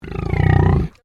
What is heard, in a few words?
Animal Pig Landrace Growl; Edgar; Edgar's Mission Farm Victoria; Pigs; Animals